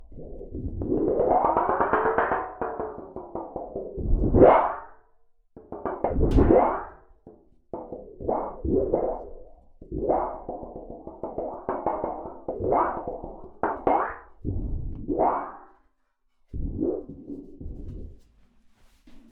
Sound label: Storage Heater